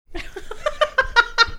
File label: chuckling